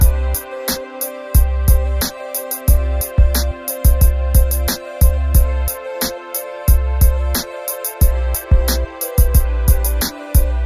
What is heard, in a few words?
bgm; risehigher